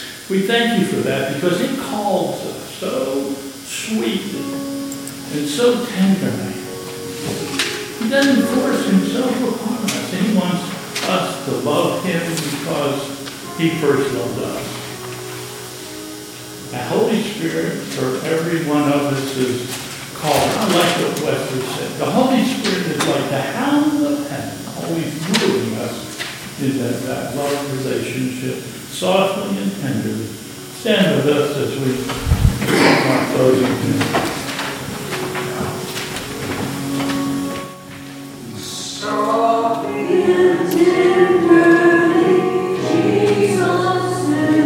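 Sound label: Hymn, church service; Softly and Tenderly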